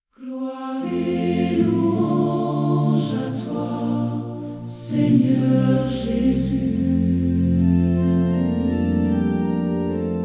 Acclamation Gloire et louange a toi Seigneur Jesus; dimanche des rameaux et de la passion du seigneur

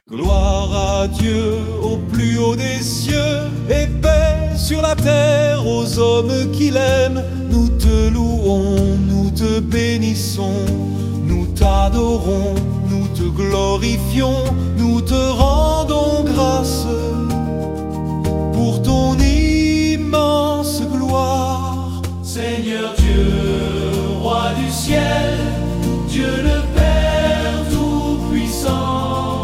Gloire a Dieu

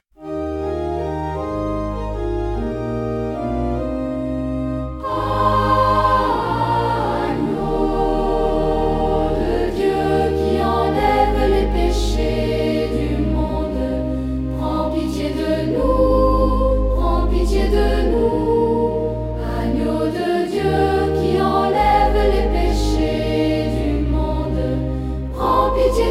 Agneau de Dieu

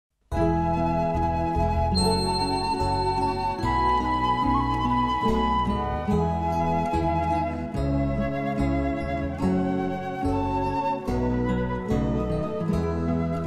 Agneau de Dieu

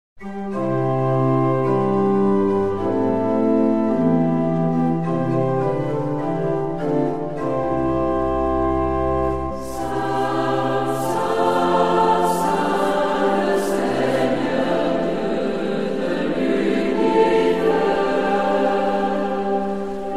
Saint le Seigneur; mariage

